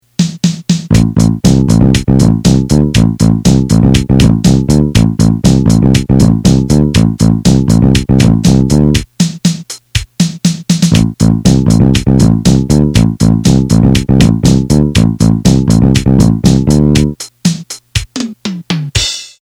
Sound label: Action Drums and Bass; bricks in motion sound library